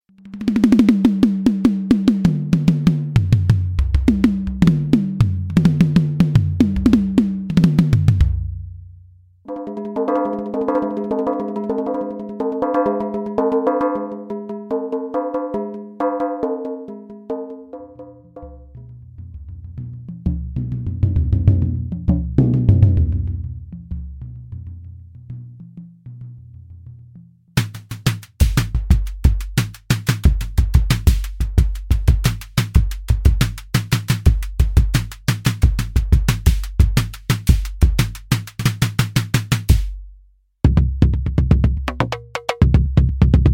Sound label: NORDRUM Materials